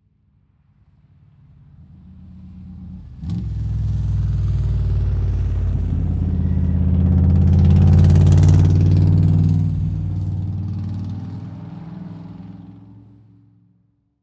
Transportation Car Race Car Drive By Slowly Race In Background